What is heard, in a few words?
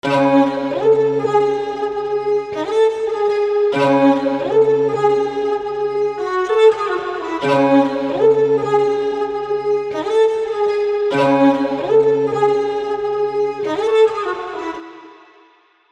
Ethnic Esraj